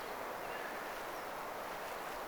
siinä voisi olla hiljainen pikkusiepon hily; taustalla pikkusiepon hiljainen hily?

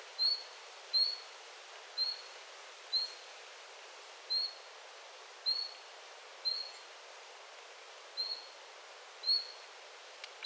vit-tiltaltti ilm keltatiltaltti

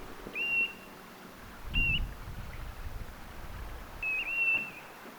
kapustarintanuori ja tyllilintu kummaltakin kaksi aanta

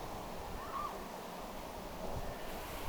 kyhmyjoutsenen aani

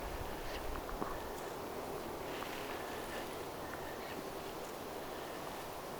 ilm jokin suippopyrstokurppalintu

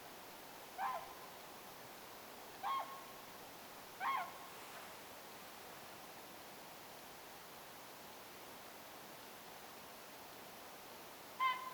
nuoren laulujoutsenen aantelya lennossa; nuoret laulujoutsenet lennossa

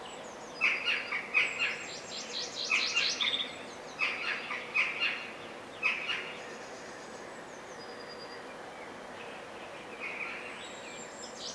naakka ääntelee rytmisesti

